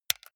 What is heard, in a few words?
console controller xbox rb press